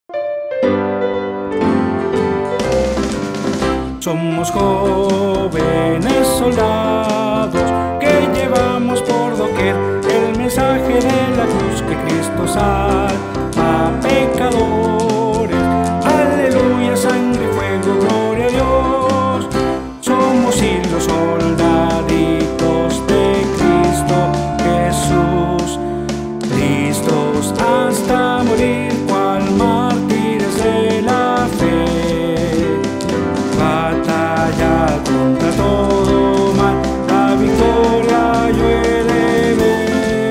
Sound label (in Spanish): Coro Lema Jovenes Soldados